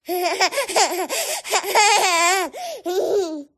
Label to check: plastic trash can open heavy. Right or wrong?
Wrong